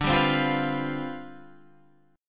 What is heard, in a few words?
C Major 7th Suspended 2nd Suspended 4th D, chord